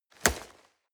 nylon leather hit wet

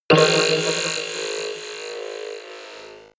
mechanic alien

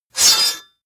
sword cut hard light fast

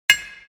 hammering

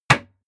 block wood

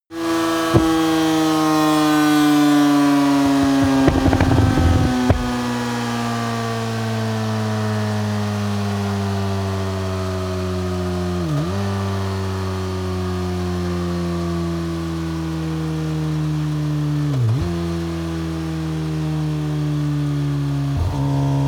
motorcycle sport bike full deceleration stop

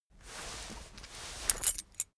key ring mid size pocket fetch

